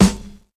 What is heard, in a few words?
bassy snare c key, Royality free steel snare drum sound tuned to the C note. Loudest frequency: 1328Hz